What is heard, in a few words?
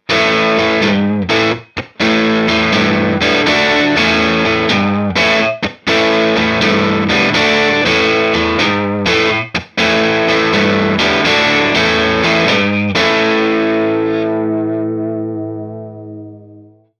gretsch; hp bas; bogner alchemist